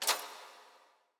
fx explosion grenade concussion release

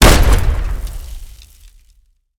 explosion grenade frag high

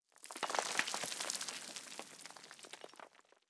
explosion impact dirt

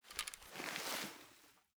Pistol 10mm Equip Down; ARMES

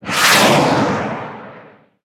fx missile flyby

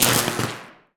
explosion robot buzz

